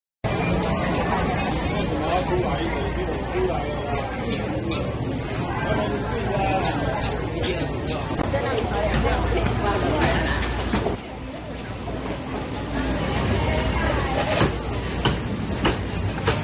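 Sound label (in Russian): внутренний уличный продовольственный рынок уличная дорога окружающая среда звук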